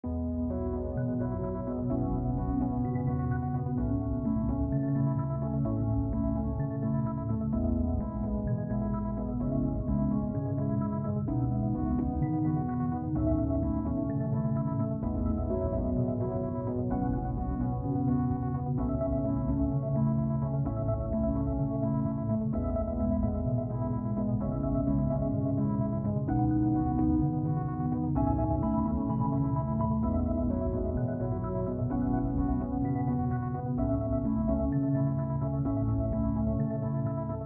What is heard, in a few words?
seamless loop; CHANNELING; GL0RYT0TH3M4CH1N3